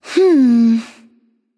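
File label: thinking, female; smite; voicepack; hera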